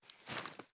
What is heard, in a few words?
cloth; tacz; daffas arsenal